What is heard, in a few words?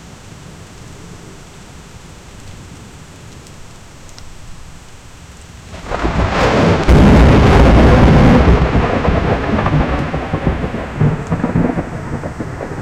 thunderstorm approaching